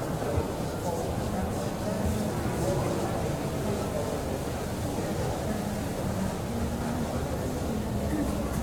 lobby, ambiances

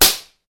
slap

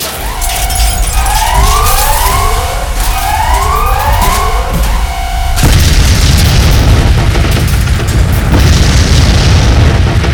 crash, ships; CosmicRage

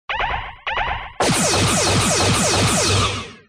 your torps; CosmicRage; ships combat

